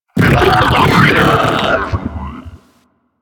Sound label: Sentient Attack, Enemies; Warframe; DE; Sentients; Ortholyst